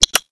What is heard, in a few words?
Lock